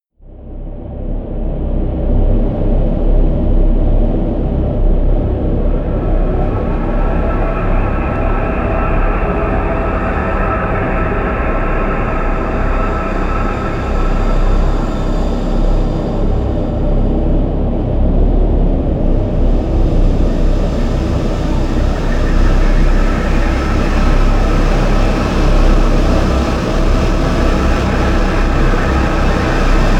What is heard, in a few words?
drone cold